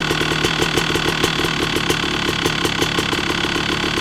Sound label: moto IDLE; aronwk collection; ndaudio